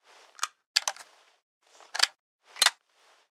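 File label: weapon pistol laser reload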